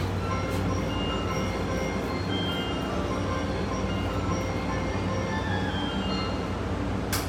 JR中央・総武線市川西, train sounds

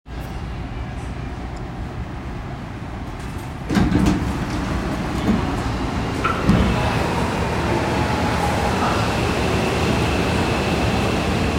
有楽町線飯田橋西, train sounds

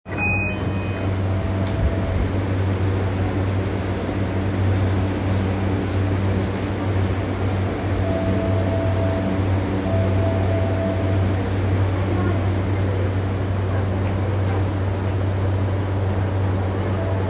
JR横須賀線北鎌倉北

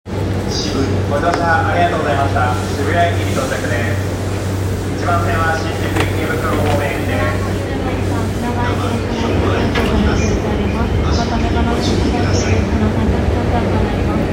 JR 山手線 渋谷 CW, train sounds

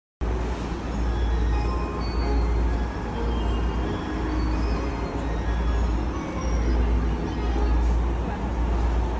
JR 武蔵野線 新木場 東, train sounds

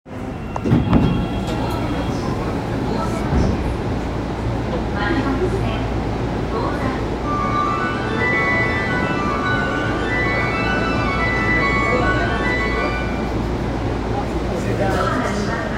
半蔵門線大手町東, train sounds

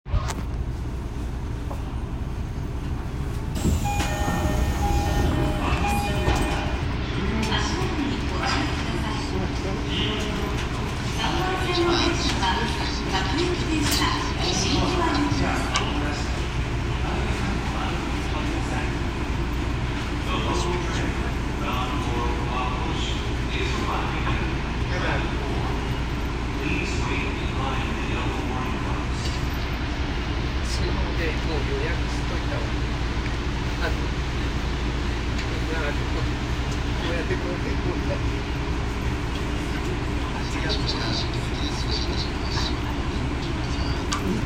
有楽町線池袋東, train sounds

